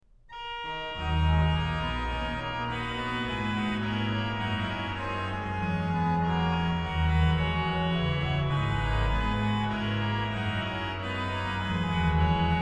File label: ORGAN, Chorale Preludes; BWV0621; Da Jesus an dem Kreuze stund; BACH; BACH ORGAN WORKS